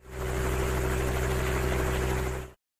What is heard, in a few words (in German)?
helikopter outdoor; minecraft